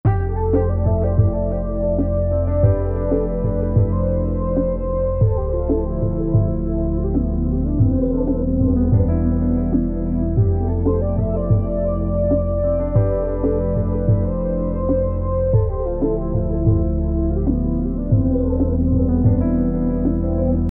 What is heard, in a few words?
93 BPM F Min; Cymatics; Aroma; Havoc Collection